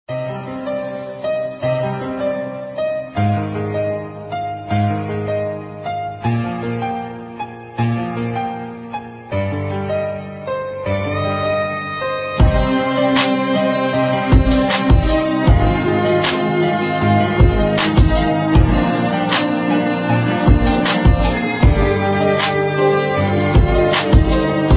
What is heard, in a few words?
instrumental love beats